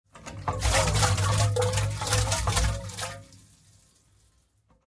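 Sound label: sounds of canister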